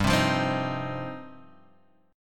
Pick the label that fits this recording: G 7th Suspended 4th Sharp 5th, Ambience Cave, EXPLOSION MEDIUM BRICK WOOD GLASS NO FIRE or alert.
G 7th Suspended 4th Sharp 5th